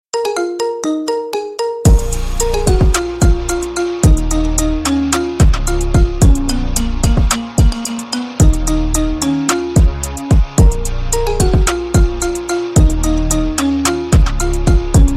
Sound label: marimba remix